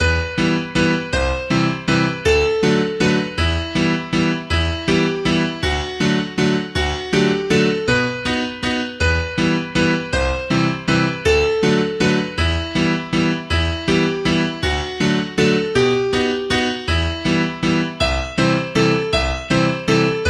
valse musette

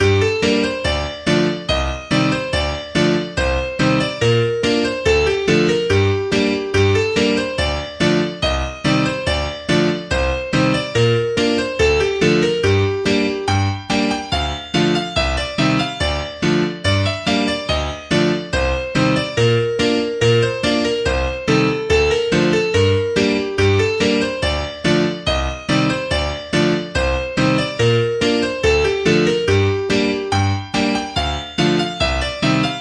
Rant; Italian Rant

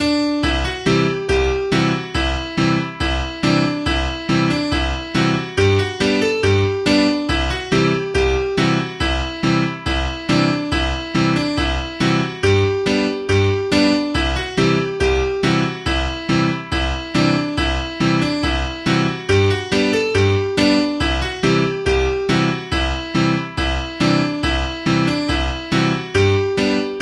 Bagpipers

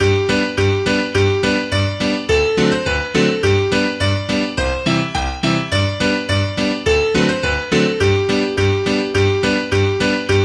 gallop; Winster Gallop

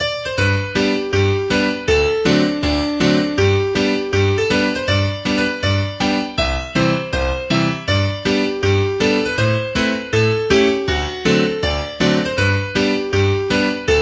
hornpipe; Whinshields Hornpipe

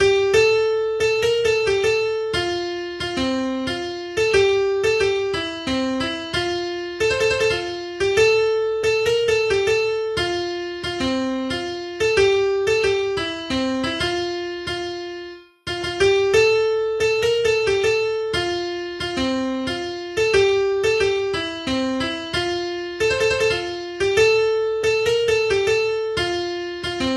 little slow Polska; Polska Fran Lovstabruk

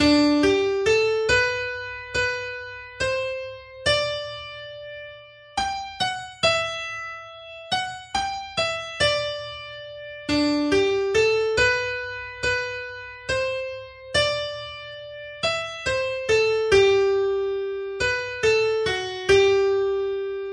slow Waltz; Michael Turners Waltz

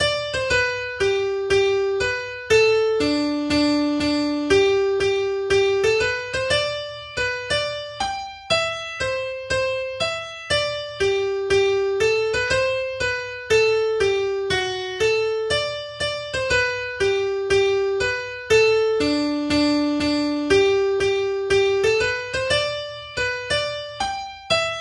little slow hornpipe; Whinshields Hornpipe

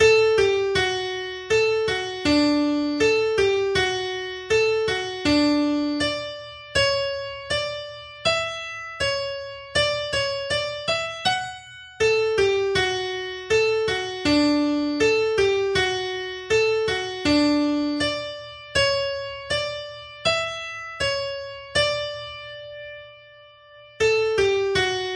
slow; Harpers Frolick

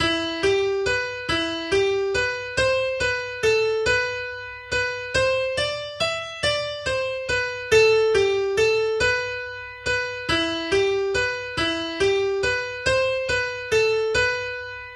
slow; Plane Tree

